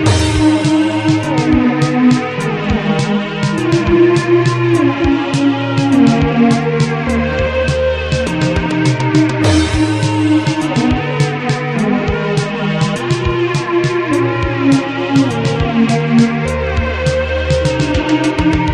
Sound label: loop